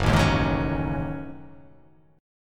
F sharp Minor 6th Add 9th

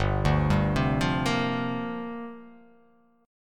G Minor 11th, chord; Gm11 arpeggiated; JGuitar

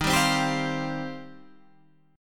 D sharp 6th Add 9th D sharp Guitar Standard; strummed; JGuitar

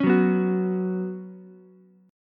Gb Suspended 4th Gb, down, Ukulele; strummed; JGuitar

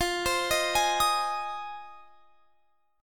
F Minor 7th F; arpeggiated

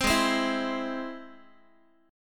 G sharp Augmented G sharp Guitar; strummed; 15,16,15,14,x,x

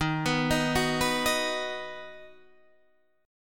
Eb Minor Sharp 5th Guitar Half Step Down

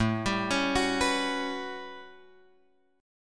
A 13th A, guitar chord; A13 Chord {5 X 5 6 7 7} arpeggiated

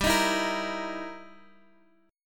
E Minor Major 7th Flat 5th G

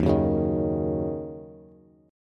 Eb Minor 7th, down, Bass; strummed; chord; JGuitar

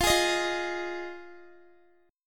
F Minor 7th, down Guitar; Fm7; strummed; 4F,4A,5C,5G,5C,6E

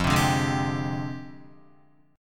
F Minor 6th Add 9th, Guitar; JGuitar

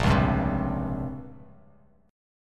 A sharp Minor 6th Add 9th, Bass Standard; strummed; 6,4,5,5; JGuitar

